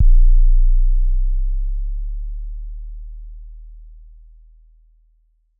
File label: Tempest 808 Kick, Drum Machines; 300 Drum Machines; Dave Smith Instruments